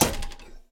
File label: Physics-Object Saw Impact